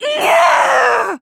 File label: nyagh; toko